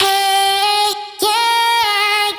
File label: Vocal Sample Pack Phrases Ab; Slate Digital Omnivox Vocal Sample Pack